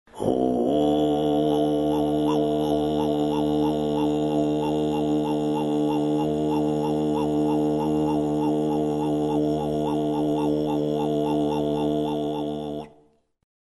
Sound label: kargyraa ezenggileer